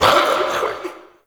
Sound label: Mockery Male Vocal Pain; Characters; Enemy; Vocals; Mockeries